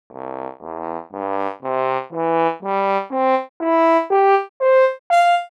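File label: valtorna, French horn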